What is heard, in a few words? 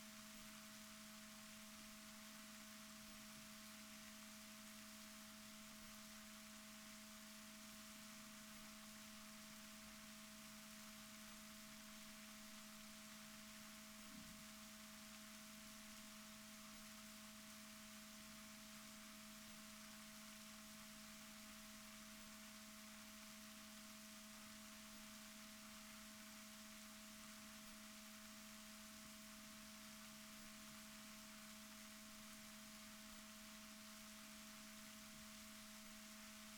rorquals negatif, Balaenoptera Physalus Chile